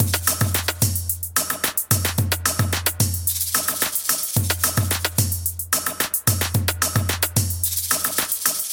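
TOP LOOPS, Organic House / Deep House Sample Pack Oriental & Middle Eastern; Azura – Organic House Sample Pack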